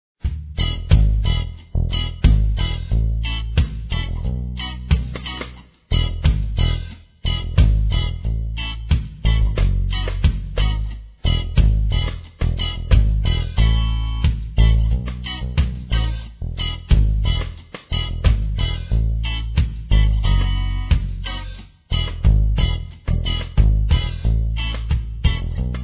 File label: tropical song